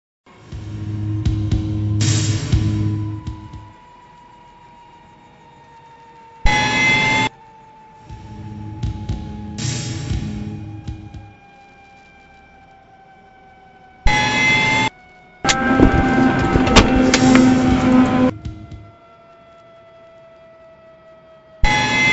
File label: helicopter; jesus, it's quiet #1